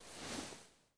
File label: body Slide smooth